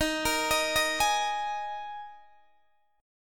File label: D sharp Suspended 4th D sharp, Guitar DADGAD; chord shape